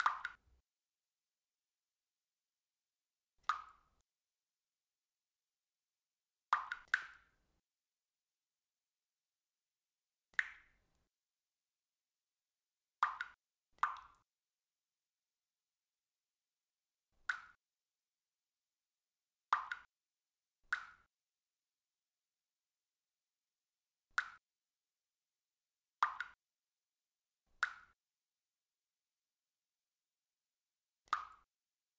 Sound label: Dripping